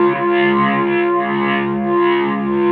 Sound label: Orgrok, Pads; Sonic Foundry (Sony Creative Software); Synchro Funk